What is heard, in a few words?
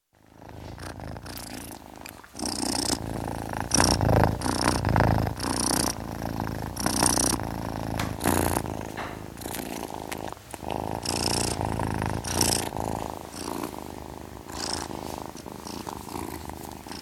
Ten week old kitten, purring; Cat Sound Effects; Cats (Sound Effects:Animals); BBC